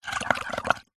drink bubbles blow glass water surface through straw short burst; food